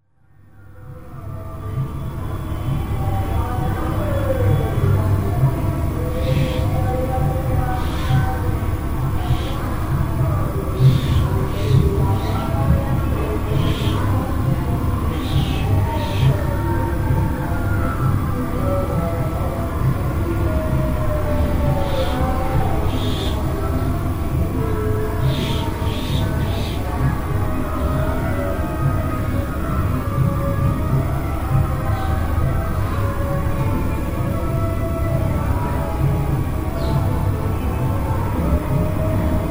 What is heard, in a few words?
midday ambience; radhakund